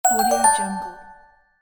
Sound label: new message alert